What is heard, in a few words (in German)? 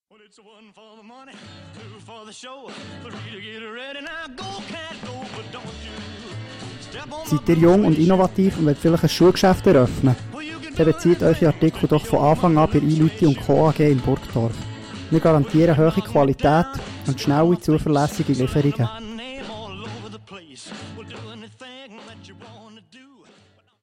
Jingle; langnau berufsschule k148c